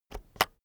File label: keyboard external enter press slow